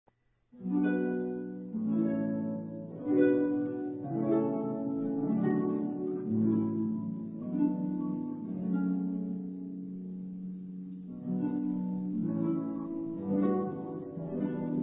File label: Harp; Harp Suite